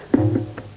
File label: buzz in; poland